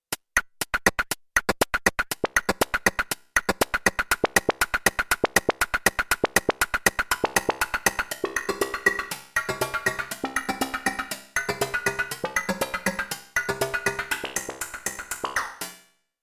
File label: Electronic; KOPRO+